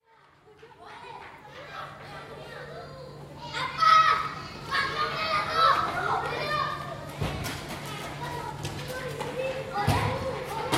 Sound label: ambiance denpasar; geocyclab